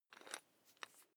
electric drill bit fasten wrench turn medium

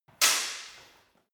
sword hit sword single

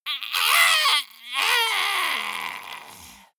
monster die high

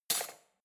cutlery spoon drawer place hard

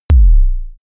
sub kick short

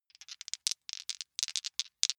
big two rattle in hand

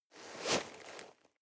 nylon leather soft short

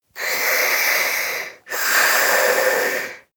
breath female sleep hiss

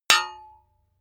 hammer hit metal dull

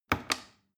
plastic button small click heavy slow

